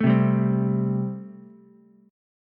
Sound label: A-Minor 7th A Ukulele; Am7 strummed; JGuitar